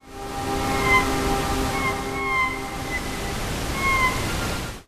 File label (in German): moewe im sturm, Trautonium